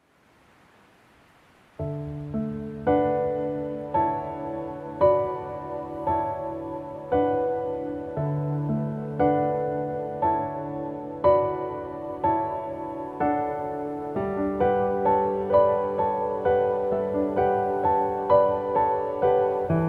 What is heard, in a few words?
theme music